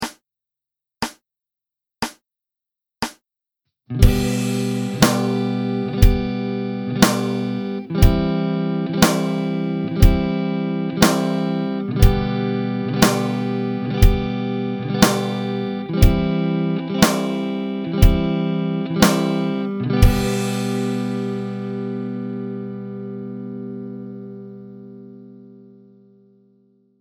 two finger G and C chord exercise

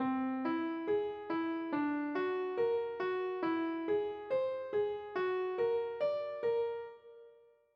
whole tone ex two

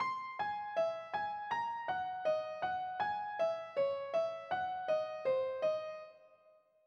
altered scale

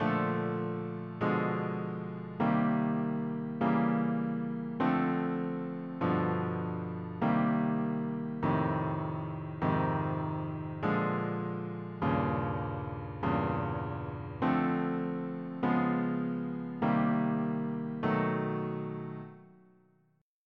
rhythm changes passing dim

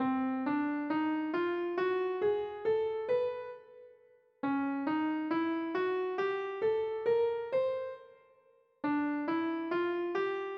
whole half diminished; The Diminished Scale

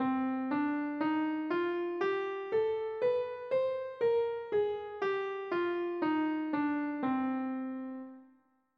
C melodic minor

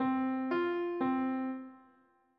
perfect 4; piano

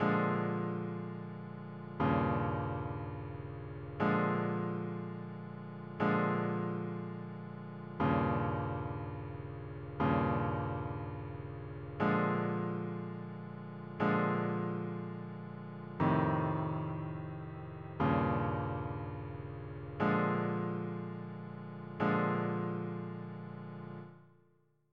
blues pop progression

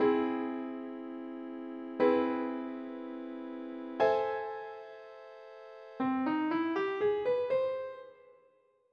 augmented ex; The Augmented Scale

